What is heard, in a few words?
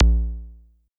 BD er1 lazer bass drum, DRUMS; Polyend; Legowelt